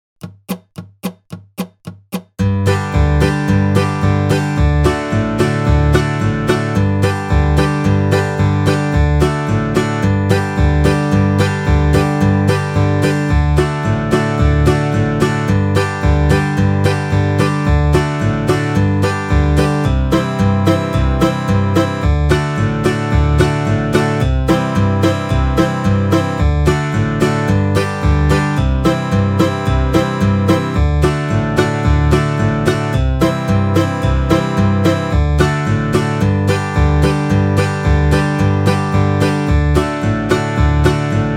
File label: 110BPM, Old Time Fiddle Tunes